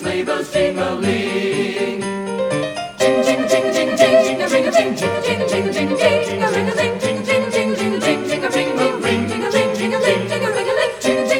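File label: soprano alto tenor bass Full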